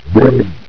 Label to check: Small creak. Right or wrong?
Wrong